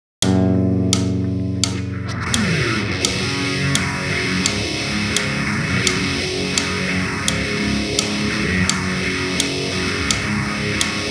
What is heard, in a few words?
riff click